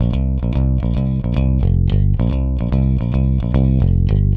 Rock, Bass; Bass X

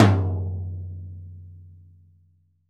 LTOM MF; VELOCITY TOM 2

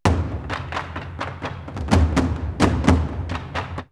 Nairobi 128, drumloops World Ethnic; X-Static Goldmine

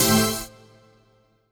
CHORDS; EastWest; Dance Mega Hip Hop Electro

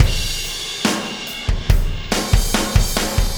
Impact Crater Beat, Processed Drumkits

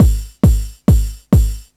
hi kick together, Deep House, 135bpm

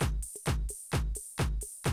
130, Classic DrumLoops

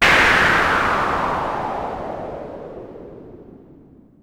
Whoosh, sci-fi

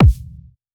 kick jab; Polyend; Recue

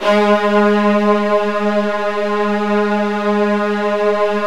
Virtuoso Strings

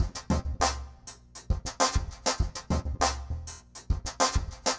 Remixed Drums 100BPM; The Roots Drumloops

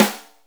Snare, Akai MPC-500 Drum Machines; Toolkits; Snare TKit

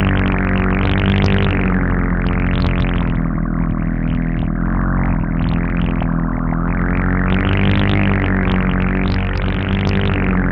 machine drone, threat drone; Zero-G Ambient 1

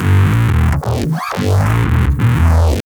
Realbaby, bass; Drum&Bass; Instrument Samples; EZRollers

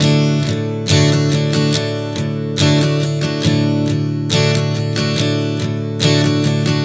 Strum 070 D, One Chord Strumming 070 bpm; Sonic Foundry (Sony Creative Software); Songwriter Acoustic Guitar Companion

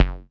BD, Bassdrums, Roland TD-20

